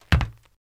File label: FS Runner On Metal, singles metal